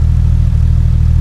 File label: 993tt idle, Engine; Cars; XBOX